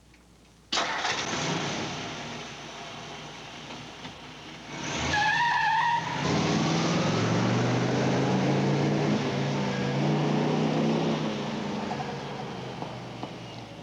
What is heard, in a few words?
Car Start And Drive Away With Squeal